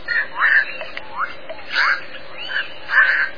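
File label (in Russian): крик нескольких особей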